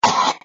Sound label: Cough; Lewd SFX